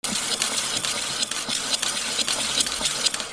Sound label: GARAGE